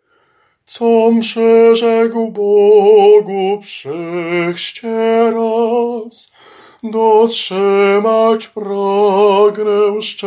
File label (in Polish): TENOR; Com przyrzekl